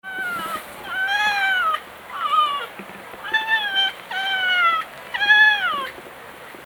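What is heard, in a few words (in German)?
Zoo Reykjavik Polarfuchslaute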